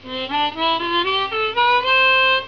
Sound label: quick scale up